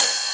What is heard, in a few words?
Cymbals Ride; Vengeance Samples